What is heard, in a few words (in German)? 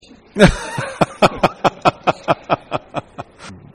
lachen